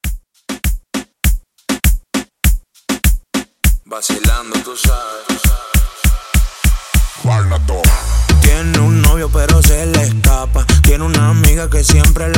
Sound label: Reggaeton Re-Drum, 100